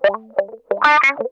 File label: TIMER, Funk Guitar; Zero G - Funk Guitar